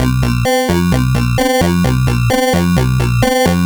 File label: Sh101 Loop, 130BPM